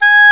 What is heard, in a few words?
Oboe, Orchestral Winds; Roland LCDP04 Orchestral Winds; WND Oboe f